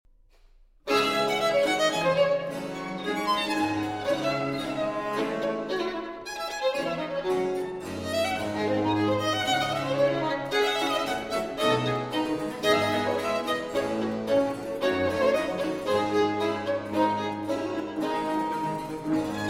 Violin Sonata in D Minor, Allegro

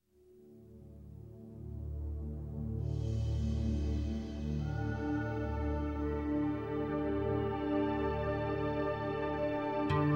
Implora (Cello, Flute)

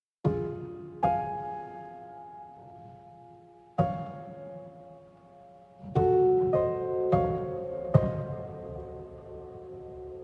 Clair de Lune, Piano

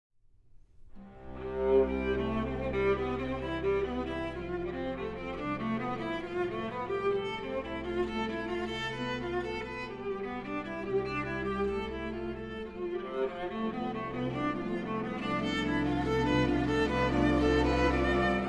Suite For Viola & Small Orchestra Prelude

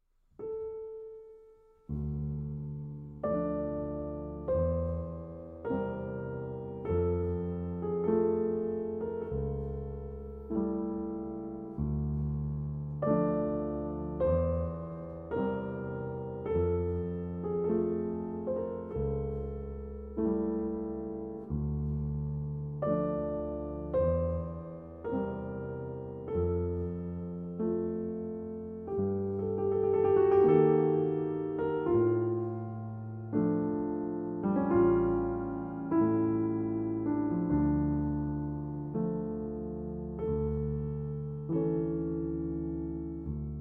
Nocturnes, Andante in F Minor

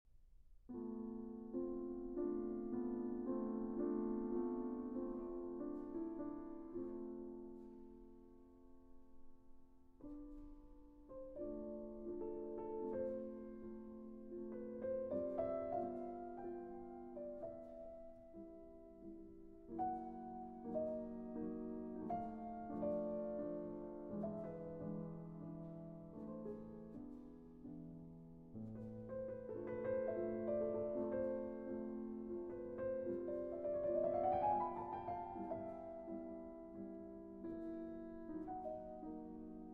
Mazurka in A Minor

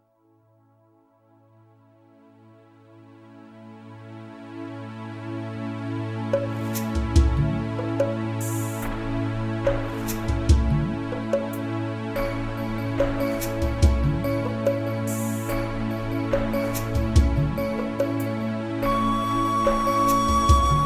Ocarina

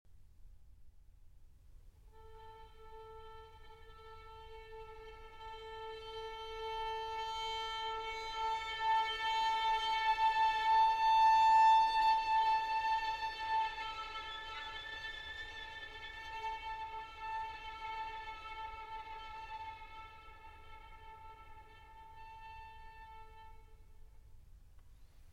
Nocturne For Violin Solo; Saariaho

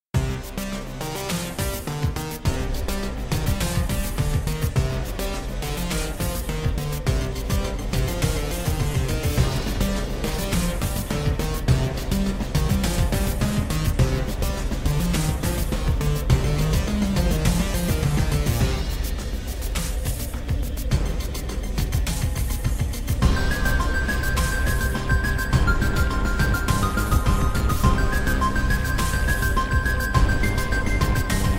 Futuristic Music

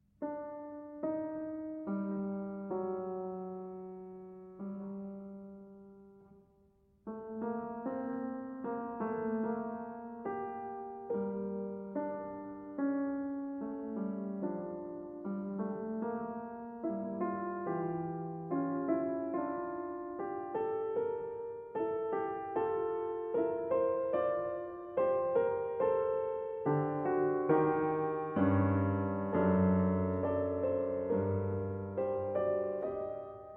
Fugue in G Minor; Prelude & Fugue in G Minor, BWV 861

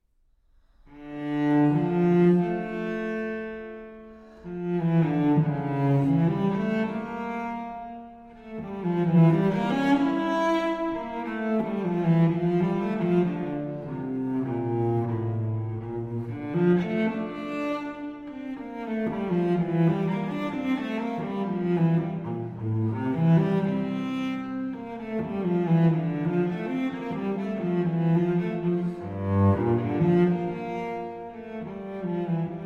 Cello Suite in D Minor; J.S. Bach; Cello Suite No. 2; BWV 1008

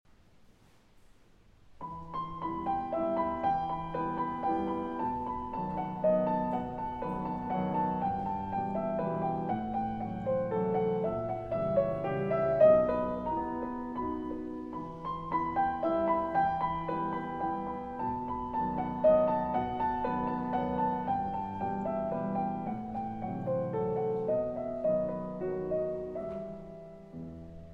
Waltzes

